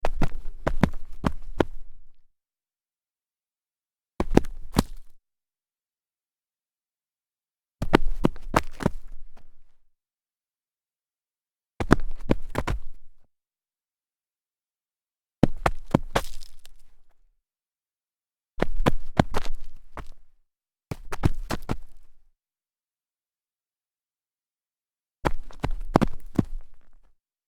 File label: sneaker mount stumble dirt, foley